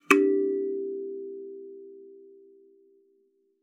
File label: KALIMBA G4; Xsample VOL-7